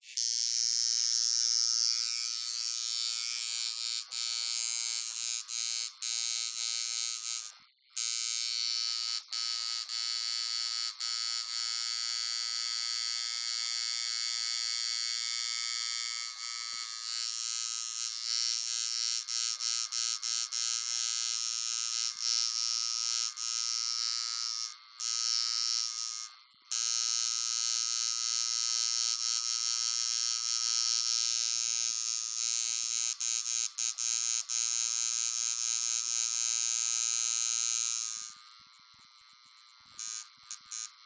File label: foley tattoo gun operating tattooing